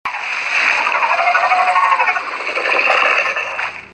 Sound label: Growl; Magmar